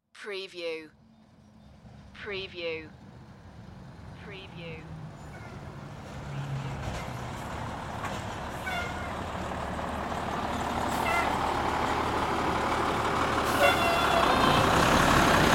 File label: Semi Truck Pass By; sound effects bible